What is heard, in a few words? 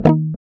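GUI D#, acoustic guitar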